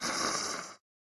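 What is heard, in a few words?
lizard shaman wound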